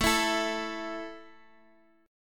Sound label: A 5th A down, chord; strummed